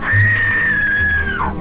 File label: scream; igorsfrightshack